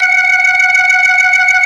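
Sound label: B3 low distortion, ToneWheel Organ; E-MU Formula 4000 Series Vol. 3 – Analog Odyssey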